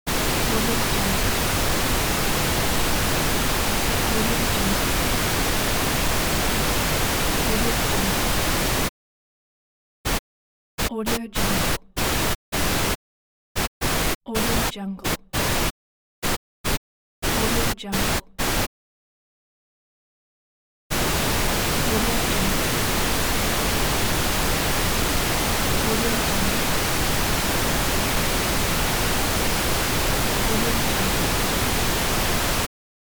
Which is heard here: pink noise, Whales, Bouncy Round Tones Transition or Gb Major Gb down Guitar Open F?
pink noise